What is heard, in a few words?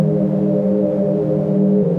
Pretty Lofi Drone; Tree Tone Presets; Ableton; Factory Packs; Inspired by Nature by Dillon Bastan